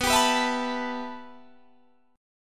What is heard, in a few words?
B 7th Suspended 4th Sharp 5th, Guitar, Standard; B7sus4#5; strummed; JGuitar